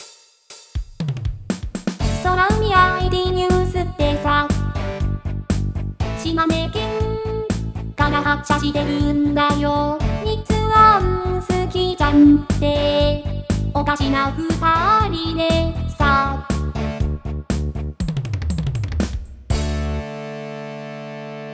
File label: soramusic